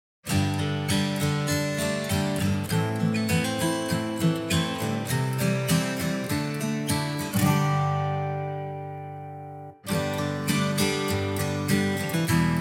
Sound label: g pentaton, fiddle